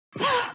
breath heavy

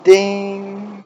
correct answer scuffed

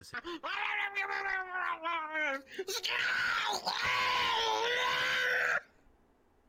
death noise

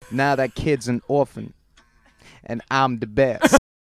cute song

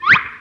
cartoon slip

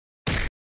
punch sfx; family guy version

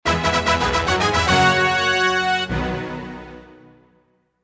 mini fanfare

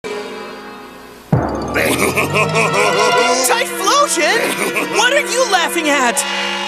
laugh; typhlosion

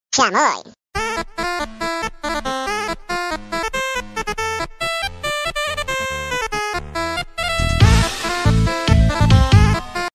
8 bit music

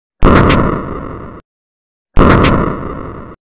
shotgun

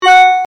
iphone dingz

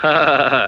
huh uh uh; fudd

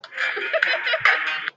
cute giggle

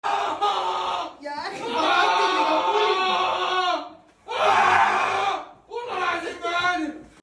pain; lactic acid

